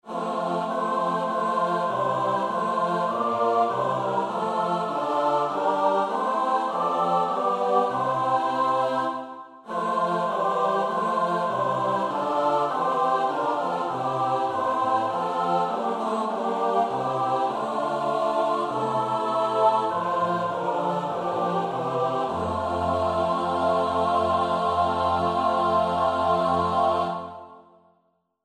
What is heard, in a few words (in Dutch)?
S-A-T-B; Nieuw Liedboek; NLB 474; Oefenbestanden